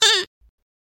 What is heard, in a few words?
monster unclick